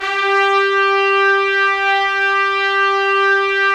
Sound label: Trumpets; Roland - LA Composer vol. 4